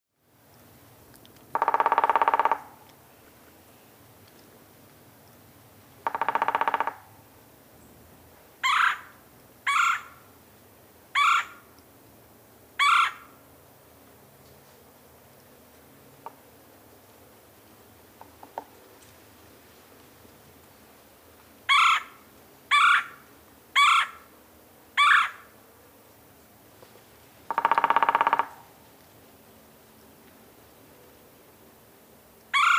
Red-bellied Woodpecker Melanerpes carolinus

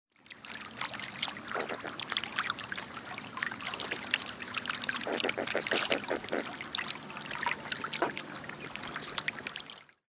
Roseate Spoonbill Platalea ajaja

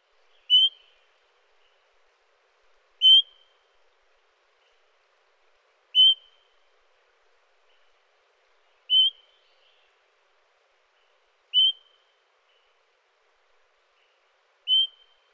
Swainsons Thrush Catharus ustulatus

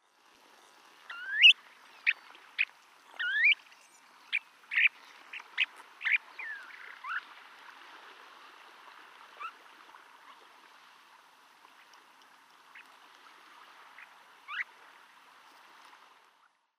Snowy Plover Charadrius nivosus

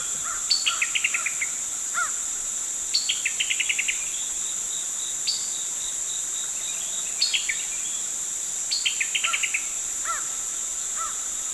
Summer Tanager Piranga rubra

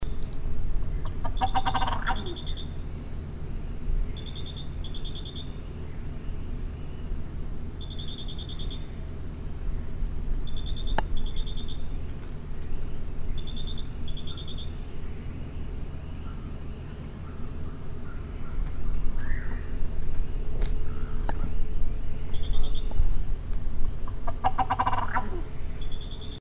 Ocellated Turkey Meleagris ocellata

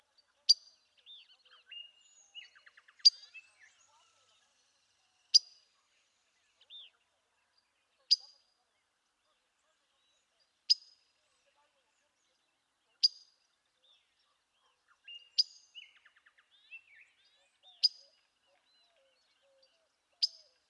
Blue Grosbeak Passerina caerulea eurhyncha

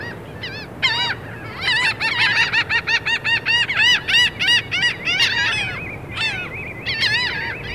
Laughing Gull Leucophaeus atricilla

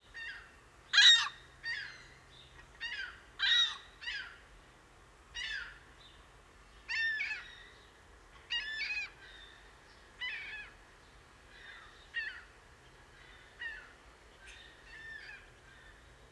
Ring-billed Gull Larus delawarensis

